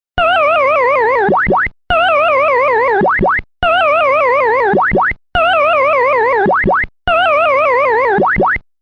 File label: Pacman Game Over